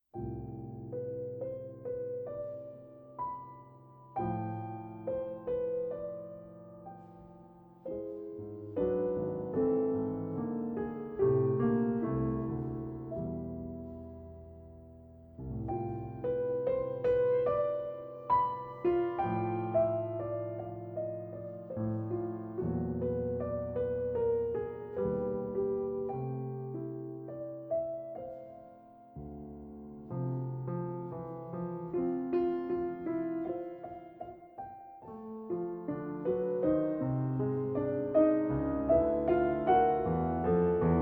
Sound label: Prelude for Piano